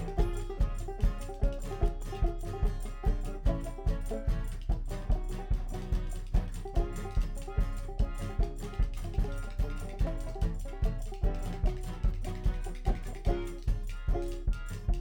Chordz

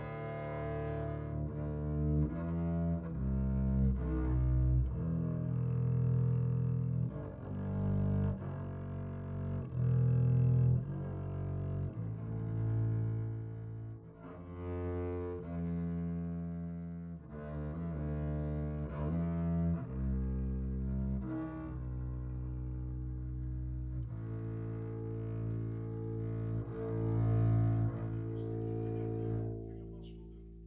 Bass Mic

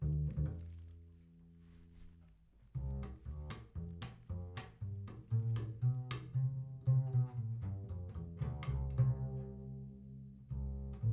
Banjo

